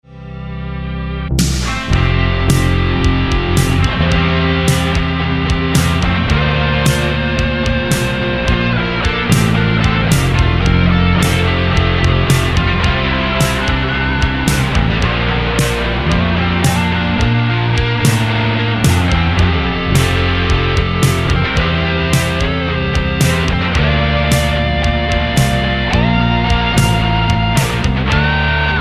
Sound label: guitar solo